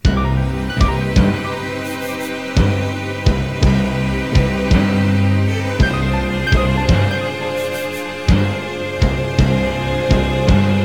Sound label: Lose; Karei